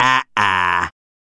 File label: "Ah-ah"